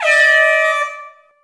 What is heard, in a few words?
startlight green GO; AOS RF1 Kart Demo